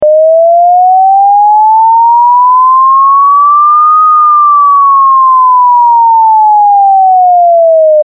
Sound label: Siren